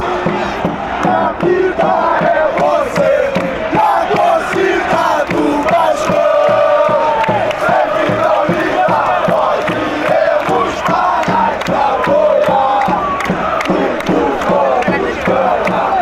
chant, fanchants

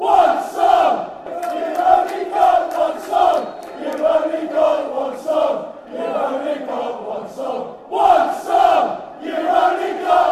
youve brighton, fanchants

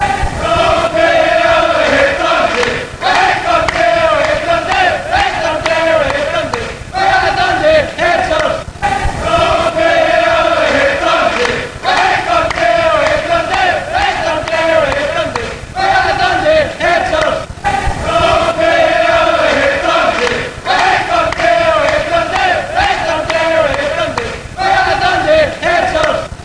we ate dundee, fanchants

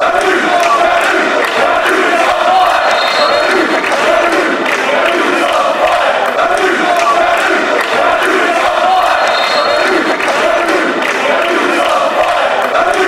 is on fire, fanchants; kanu

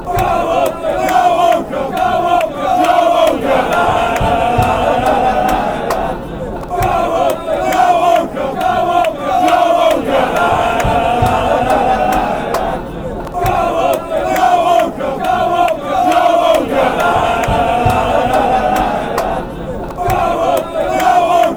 song, fanchants; kyle walker

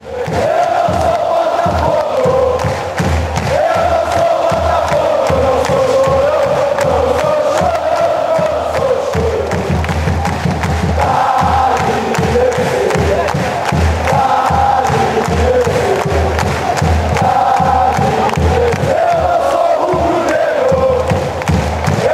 la bamba tricolor, fanchants

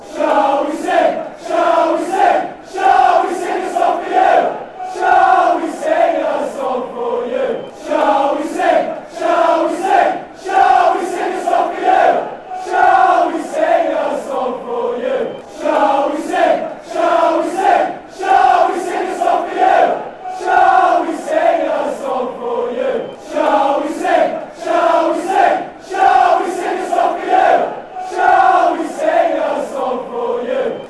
shall we sing song you, fanchants; swansea

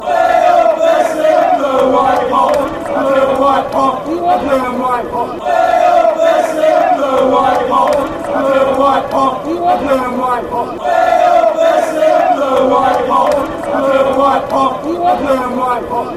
blue and white pot, fanchants

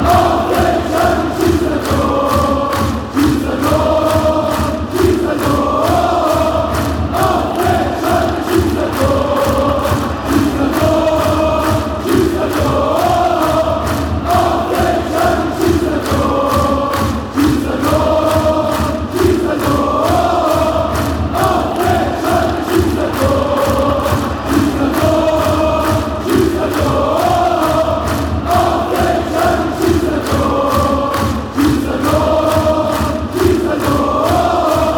score a goal, fanchants

